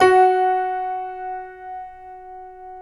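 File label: six pianos, Distant Stack; Optical Media International; Sonic Images Library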